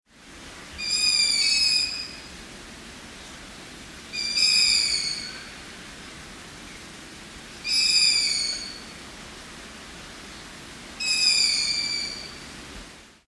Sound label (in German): waldohreule junge